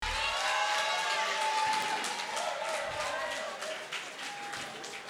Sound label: Crowd Cheering